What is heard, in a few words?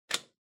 RJ Wall Socket Lan Plug In